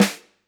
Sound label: Snr, drum